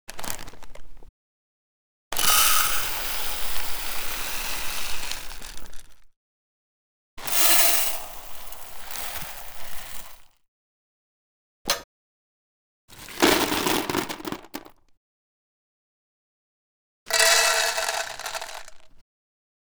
Grains; Soundmined

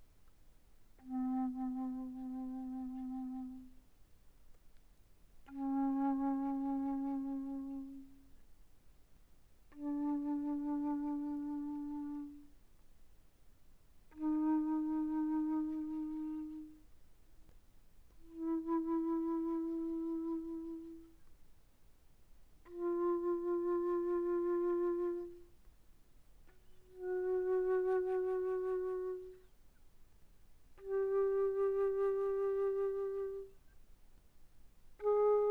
Alto Flute pp C4 B4, woodwinds

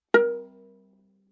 Viola pizz ff sul A Bb4; MIS Pitches - 2014; Strings

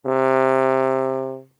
Tuba ff C3; Brass

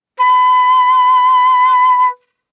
Flute vib ff B5 stereo; Woodwinds

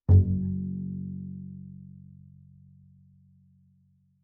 Bass pizz ff sul E Gb1, Double Bass; MIS Pitches - 2014; Strings

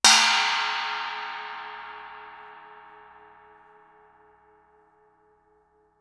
chinese stick bell ff stereo, Suspended Cymbals; Percussion

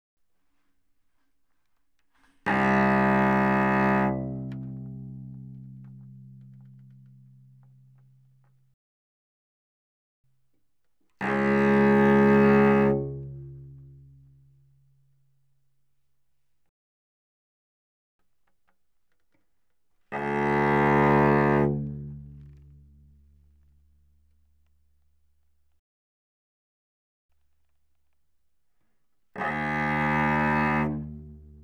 Cello arco ff sul C C2A2; Strings

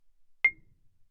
Marimba deadstroke ff C7; Percussion

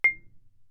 Marimba rubber ff C7; Percussion

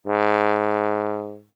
Tuba ff Ab2; Brass

